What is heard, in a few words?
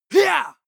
male combat attack short